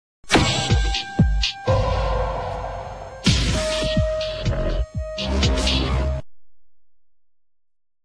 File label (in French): jingle pub; w9